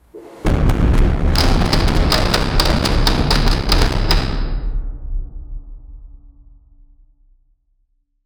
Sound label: cinematic horror sound of, ape